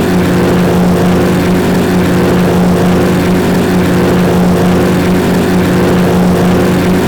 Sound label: R2800 P47; engine; hikouon; engine sounds